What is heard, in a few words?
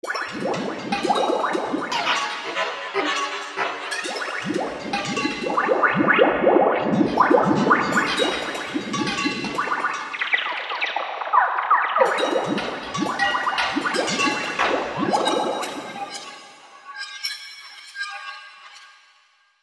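Drown arpeggio, V-Synth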